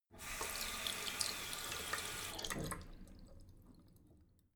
tap flow light short, badezimmer

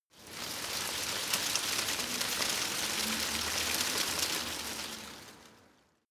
scrub small branches rustle; haus-garten

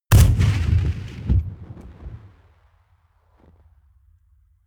rifle ak-47 exterior fire single slo-mo; comedy-cartoon; Pistols and guns; pistolen-gewehre

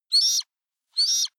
hawk young chirp; natur tiere; voegel

